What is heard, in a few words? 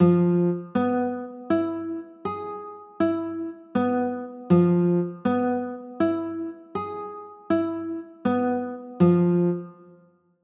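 G 13 b9 chord, guitar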